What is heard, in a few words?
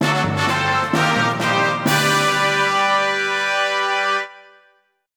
Battle Fanfare; Rogue Galaxy; PS2